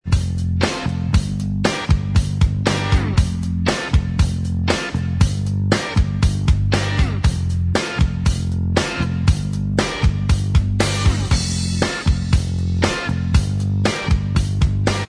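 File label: instrumental, backing track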